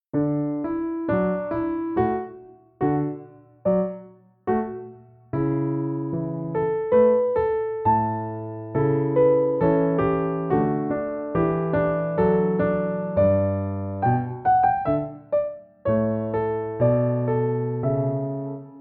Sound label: Classical Piano Loop